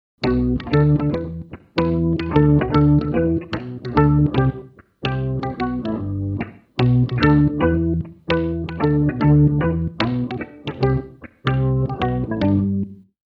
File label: bottom end